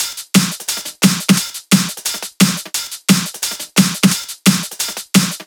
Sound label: drums 175 bpm